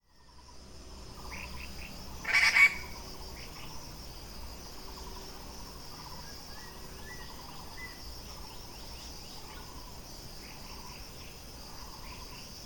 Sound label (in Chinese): 台東土坂